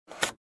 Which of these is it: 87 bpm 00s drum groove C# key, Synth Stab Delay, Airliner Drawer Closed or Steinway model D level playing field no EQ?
Airliner Drawer Closed